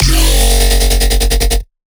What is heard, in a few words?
Bass Shot F# Plasma, Dubstep; Ghosthack; Advent Calendar 2018